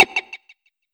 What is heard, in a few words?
counter 1 sec